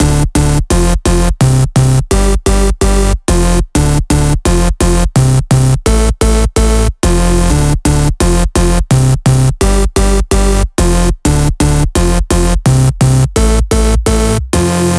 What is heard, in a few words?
128BPM Melody; Vengeance Samples; Morpheus Kit